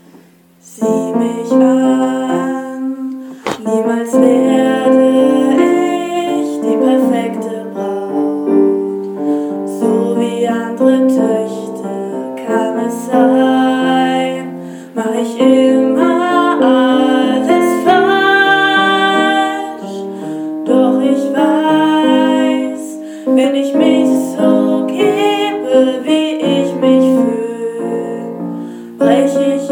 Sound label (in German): Gesang